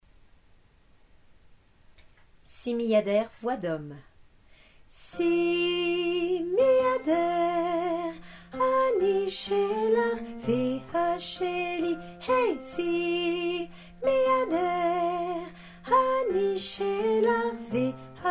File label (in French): hommes